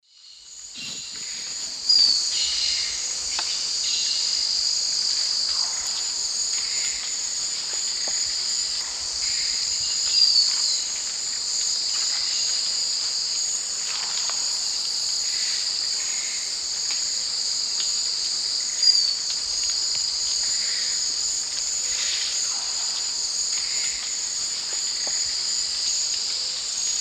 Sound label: Malacoptila fusca, song or call; S. America; Eastern Lowlands